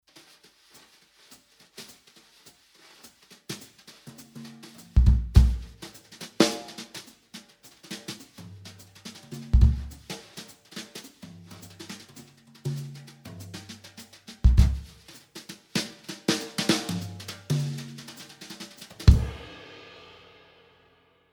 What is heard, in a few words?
big beat natural hi tuning brushes, drums